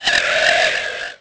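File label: scream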